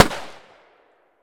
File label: gun tikka t3 battue 308 Swpph Shot Weak